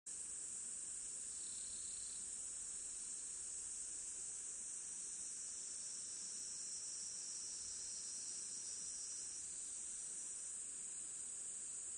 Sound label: riverside